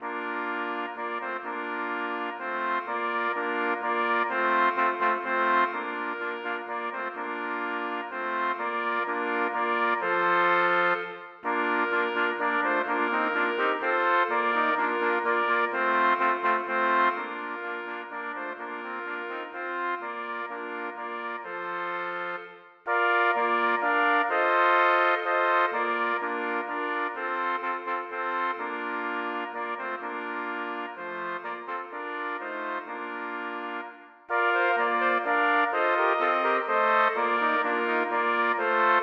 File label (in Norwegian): Duett - arrangert for fire trompeter